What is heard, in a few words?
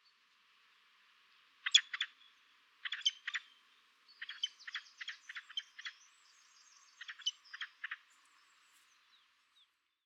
peregrine falcon; Shaheen Falcon; Birds of Ladakh